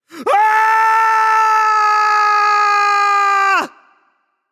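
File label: nonverbal fear; voicepack; smite; merlin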